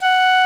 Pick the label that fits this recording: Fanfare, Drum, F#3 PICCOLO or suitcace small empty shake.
F#3 PICCOLO